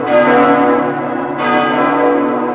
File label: church; WhiteOut